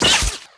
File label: saber hit body; impacts explos